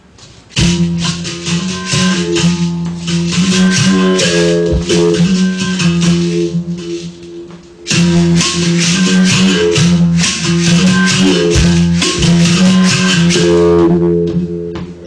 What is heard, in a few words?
berimbau; Instrumentos